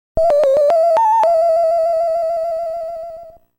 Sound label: Flute Melody; famicom nes; FDS, NES; gamerip; Zelda II: The Adventure of Link